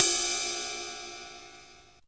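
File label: Ride, Percussion Hits; Zero-G Jungle Warfare 1, 2 & 3; 90 s Sample CDs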